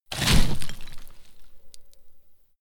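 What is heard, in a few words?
step gravel step, footsteps; schritte; menschen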